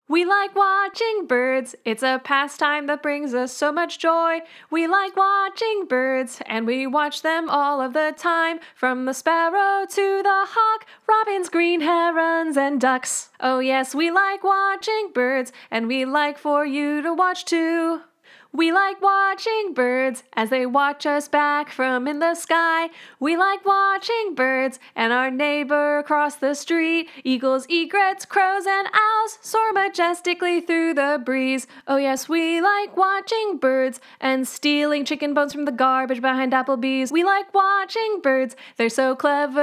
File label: Vocals